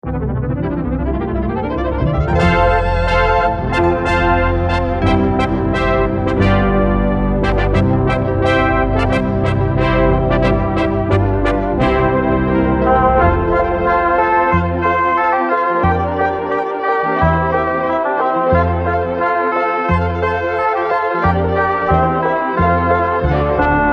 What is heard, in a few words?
Instrumental Medley